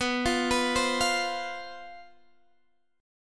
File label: C Major 7th Suspended 4th, guitar